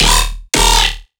Riddim Bass Shot D# Drill; Dubstep; Riddim Basslines; Ghosthack; Advent Calendar 2019 Day 17; Riddim Bass Shots

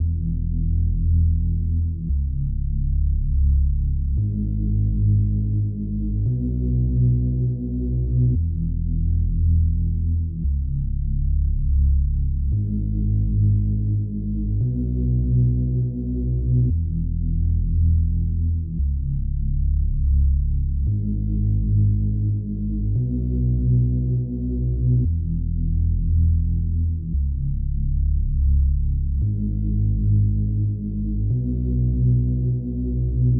115 BPM G# Min Bass, Latin; Loop Stems; Cymatics; El Jefe

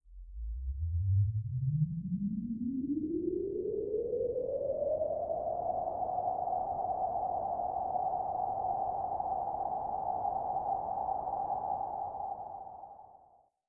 teleporter; Cartoon - film effects

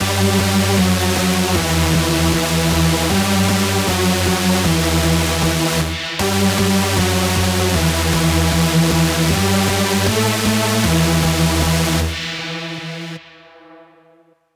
lead loop Emin 155; Music Loops; GhostHack

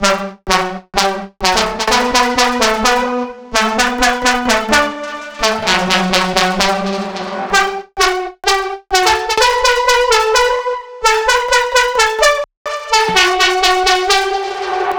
128 BPM E Min Lead; House; Drop Loops; Loop Stems; Cymatics; Volt

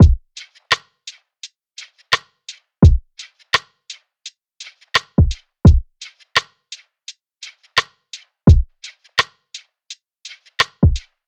Drum Loop, 85 BPM, Lofi; Breach

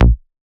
Raw Bass B

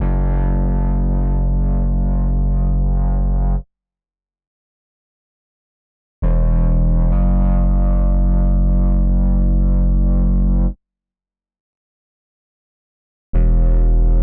135 BPM F# Min Bass; Cymatics; 2022 Melody Collection; Eco

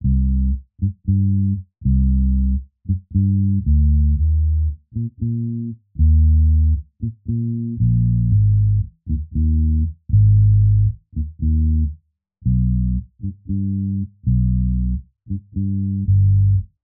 116 BPM C Min Bass; Loop Stems; Cymatics; Encantadora

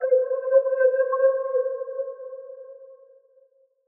SYNTH (C), Pedal One Shots; Moon; Cymatics; ANALOG EVOLUTION Beta

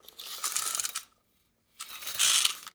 fx scratch, Cartoon Film Effects

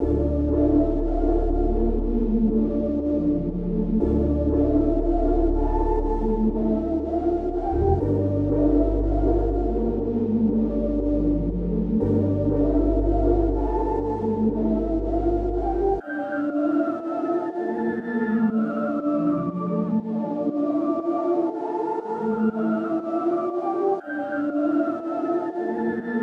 120 BPM, Melody, Trap; Cymatics; 2022 Melody Collection; Trap Pt 1

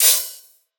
Open Hi Hat, Dubstep Drum Shots; Ghosthack; Demolition; Infernal

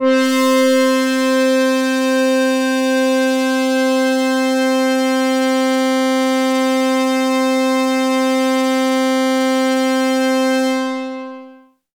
Prophet 5 LEAD Brass High - C; One Shots; Cymatics; Fantasy Synth Sample Pack

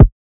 Kick C; Drum One Shots; Kicks; Cymatics; Light Free Sample Pack; Bonus Samples

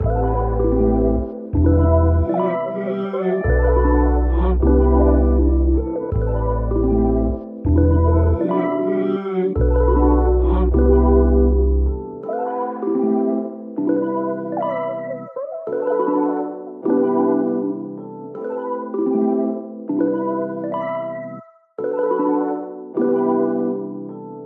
157 BPM E Min, Melody Loops; Cymatics; Orchid Sample Pack

